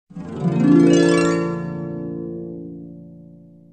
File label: harp